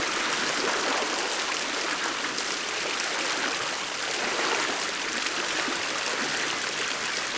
fountain; CrossCode; background

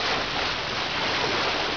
ship; Caesar 2; DOS

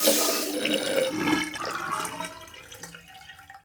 tank Empty; World Of Goo

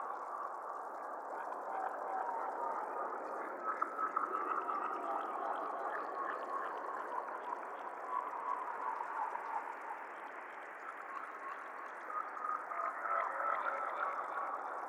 dream atmo; CrossCode; dreams

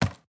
cardboard land

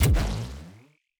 ferro swallow

